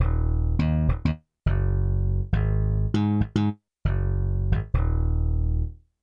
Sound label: Wire Bass C1-C3, Yamaha TX16W; Combo Performance 1